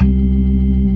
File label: ORGAN, C3 NO PERC; Keith Emerson Signature Series